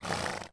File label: Horse Fort Vox; Civ4 warlords